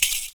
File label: FOOT PERC; Univers Sons - Raricussions